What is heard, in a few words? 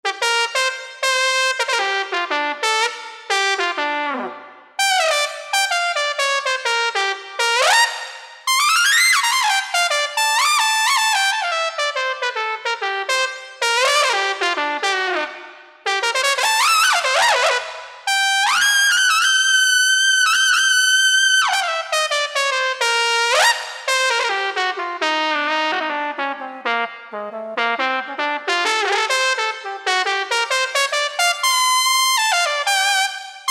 Trumpet; Yamaha EX5R; Factory presets